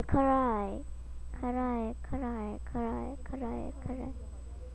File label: cry